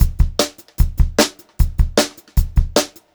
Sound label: Brushed Drum Loops, beat; USB Soundscan vol.39; AKAI